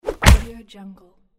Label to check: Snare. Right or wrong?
Wrong